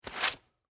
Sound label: Winter Gloves Rub, Movement, Fast; Foley; BlastWave